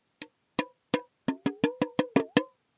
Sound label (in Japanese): tutu drum